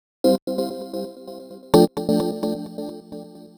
Gated Synthline (130BPM); musicradar

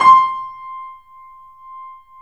C -L, The Piano; InVision Interactive - The Piano

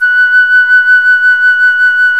FLT FLUTE, Flt vib; Roland L-CDX-03 Disk 1

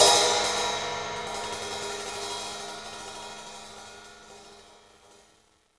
hihat - opened; JazzFunkKit

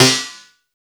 SD, 160 BPM; Ueberschall; Drum & Bass Flash Trackz

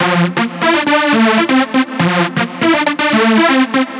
Trance Synth

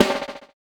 BUZZER; Spectrasonics; Metamorphosis

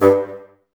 Bassoons G 2; Miroslav Vitous - Woodwind Brass Ensemble

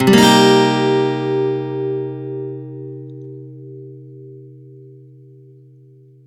C min7 downstroke, Acoustic Guitar; Sonic Foundry (Sony Creative Software); Songwriter Acoustic Guitar Companion; One Shot Chords

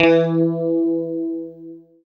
GUI, E-Guitar

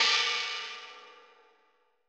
cymbal, Roland MC-909

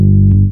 bass 4 (G); Sonic Foundry (Sony Creative Software); Ugly Remnants VOL-2; Basstuff

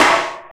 SYNTH SD, DANCE KIT

